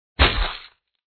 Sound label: attack contact middle; norak